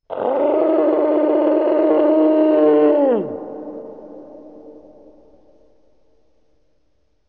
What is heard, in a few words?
dragon beast scream